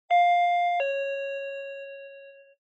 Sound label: Intercom Door Station Ding Dong